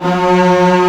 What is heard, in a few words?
STR C6 FF L, STRNGS, Orchestra; Sound On Sound - Peter Siedleczek's Orchestra